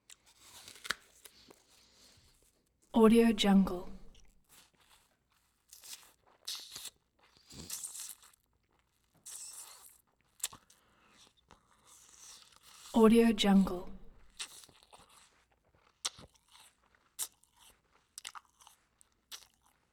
eating apple

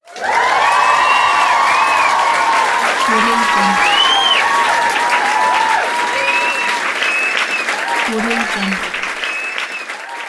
applause cheering

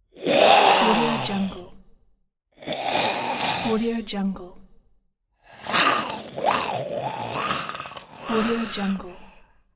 zombie

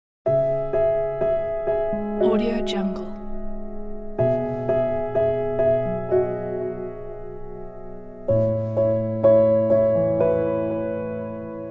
sad memories

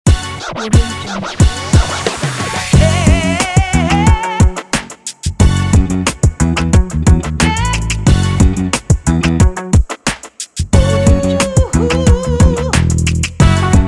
hop hip funk

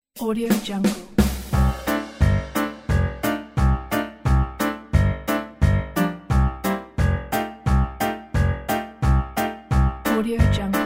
silent movie ragtime music

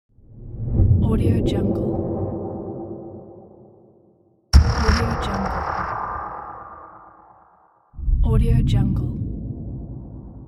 epic air whoosh

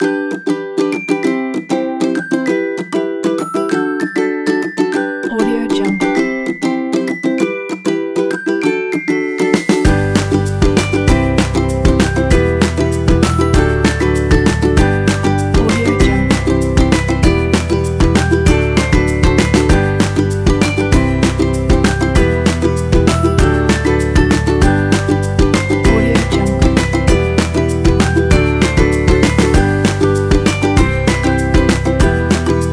happy

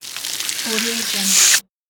hose watering

